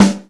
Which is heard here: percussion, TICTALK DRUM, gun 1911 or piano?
TICTALK DRUM